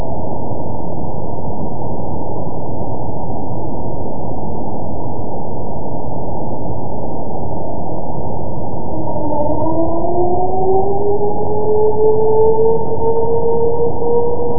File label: sound source; acoustics; env-noise